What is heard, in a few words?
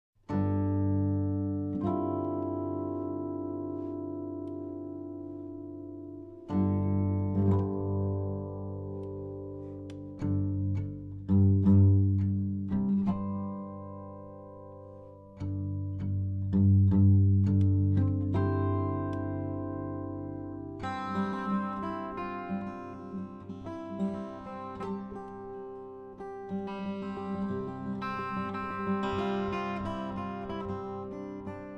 12 string